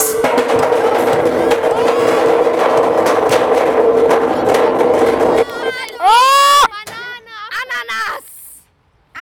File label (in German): Space Sound